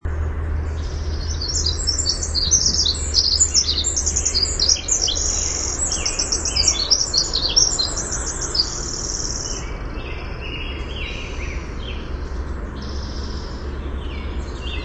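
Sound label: Winter Wren song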